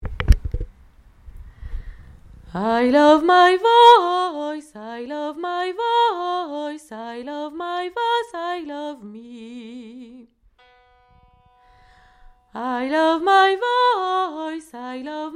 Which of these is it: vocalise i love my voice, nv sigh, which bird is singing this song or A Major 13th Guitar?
vocalise i love my voice